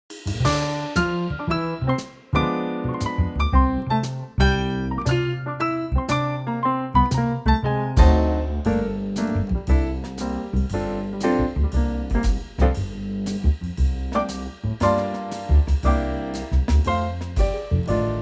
F Trio; key - F - vocal range - D to Eb; Superb Trio arrangement of this classic jazz standard

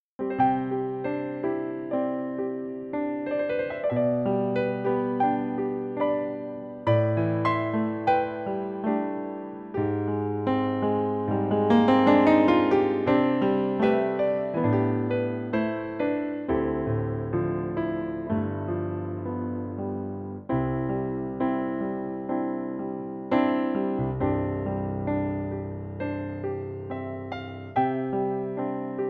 piano

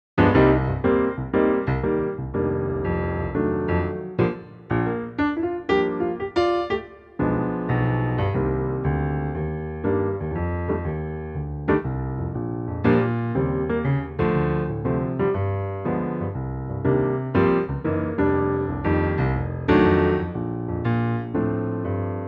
Bb piano, male; key - Bb - vocal range - D to D; Piano only arrangement; NO KEY CHANGE

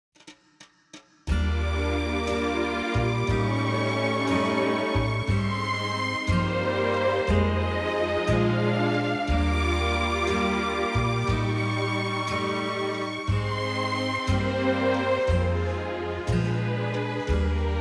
Eb to F orchestral

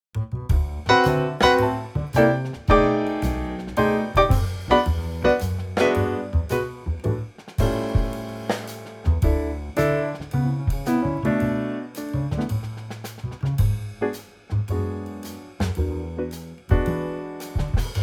D Trio

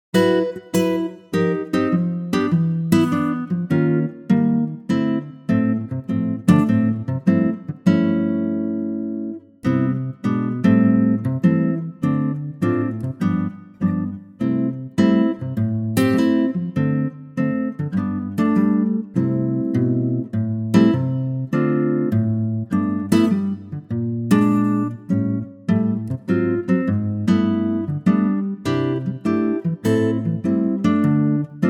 C Ac Gtr, male; key - C - vocal range - D to D; Superb acoustic guitar arrangement of the mexican classic from 1939 but in a gently swinging arrangement.

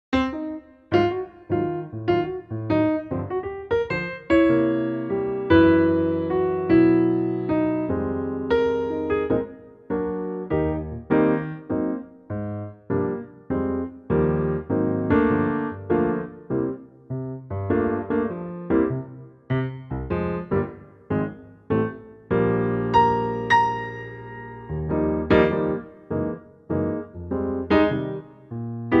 Eb piano

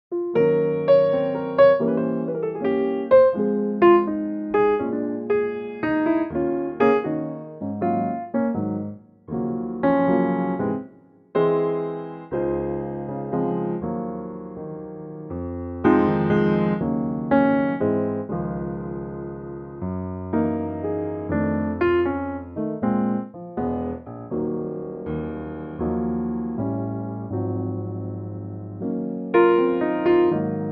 Db piano

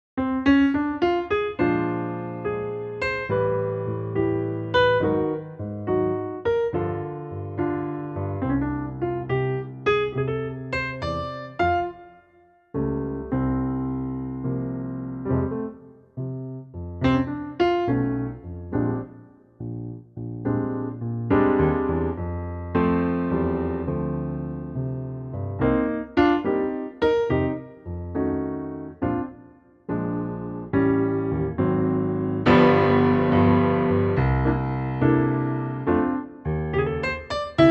F piano

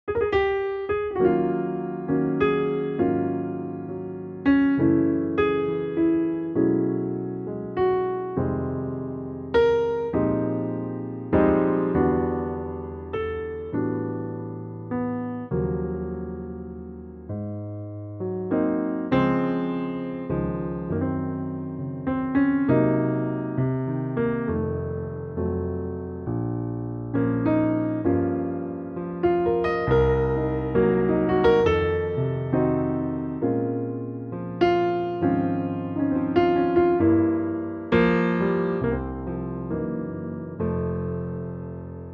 Db piano; key - Db - vocal range - Bb to Db; Wonderful classic standard in a very lovely piano only arrangement

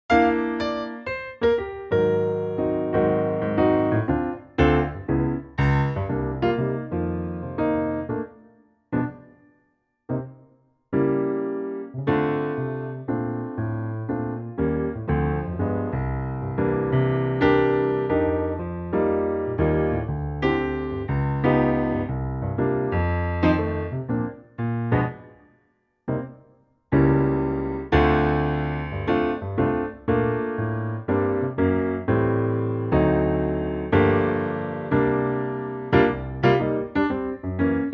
Bb piano; swinging piano only arrangement; key - Bb - vocal range - D to F

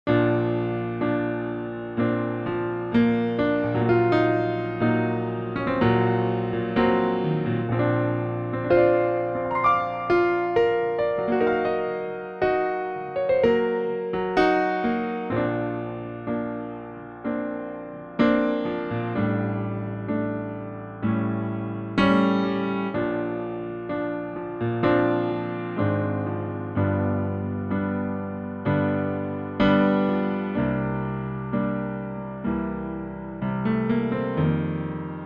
piano, male

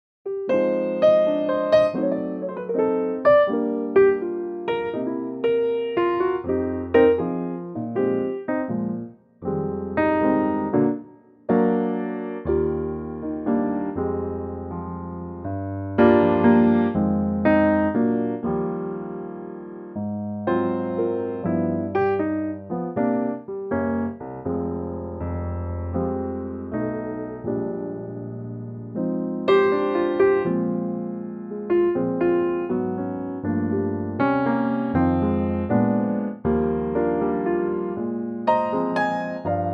Eb piano

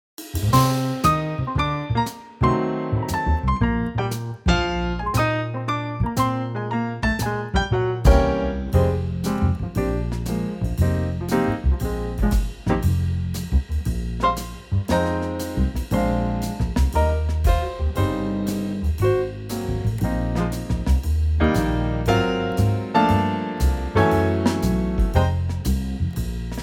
Eb Trio; key - Eb - vocal range - C to Db; Superb Trio arrangement of this classic jazz standard

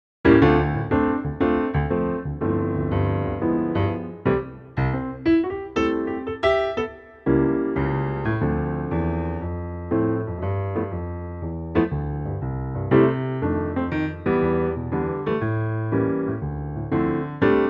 C piano, male; key - C - vocal range - E to E; Piano only arrangement; NO KEY CHANGE

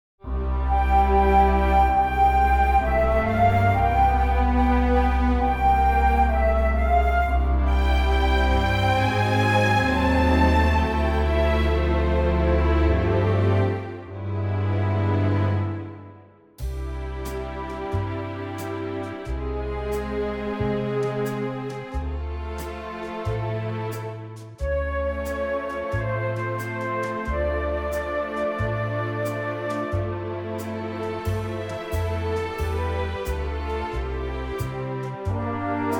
C orchestral, male; key - C - vocal range - B to D

